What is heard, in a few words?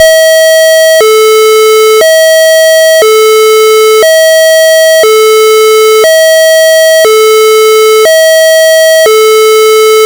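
Alternating, combined signal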